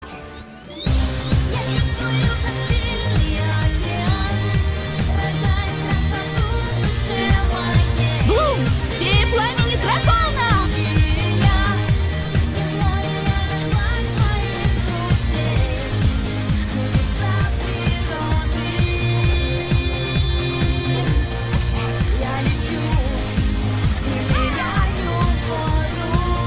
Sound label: Instrumental; Winx Club 8; Albanian-Shqip